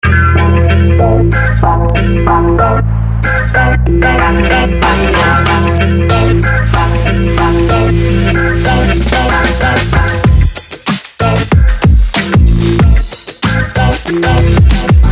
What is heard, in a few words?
hip-hop; MotionElements